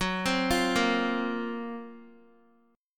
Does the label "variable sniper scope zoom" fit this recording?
No